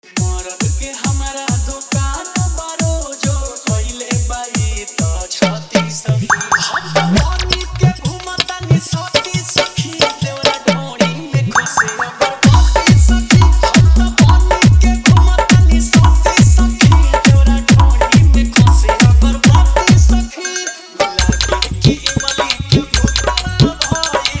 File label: Hard Bass Mix